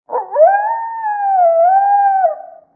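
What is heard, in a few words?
animal wolf distant; amb elements; modern warfare 2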